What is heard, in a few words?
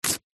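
household instructions pamphlet glossy paper rip; zapsplat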